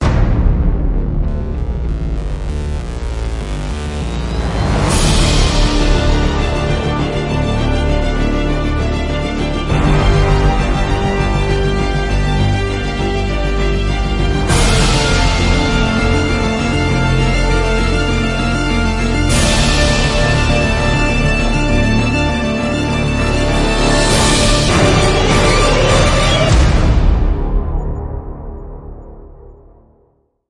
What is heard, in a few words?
No Choir